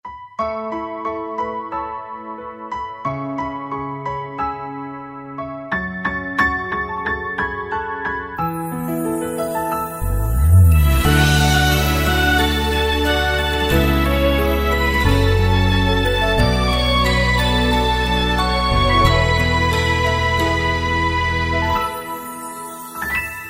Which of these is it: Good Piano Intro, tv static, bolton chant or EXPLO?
Good Piano Intro